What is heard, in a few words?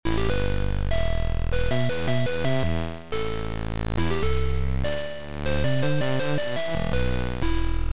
Noise